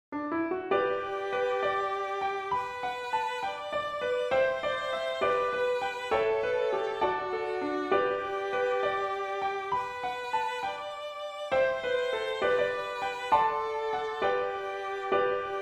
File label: jig; Trixies